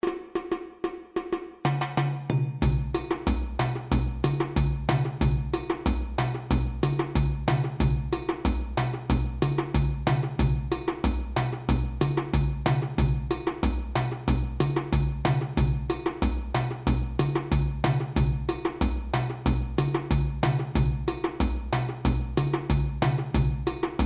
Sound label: Moribayassa Groove